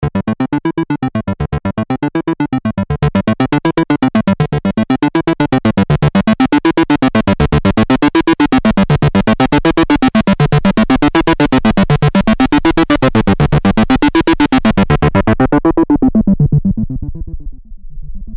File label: MS20; BOUMWARMING UNIT; otomachines; BOUM DEMO